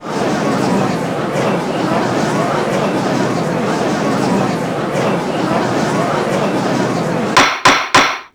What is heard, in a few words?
gallery gavel x3